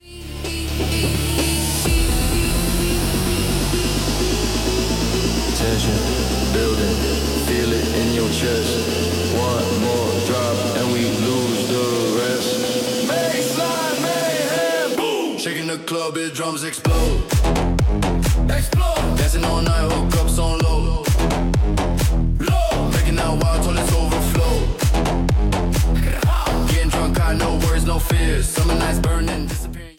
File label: bassline mayhem sultry remix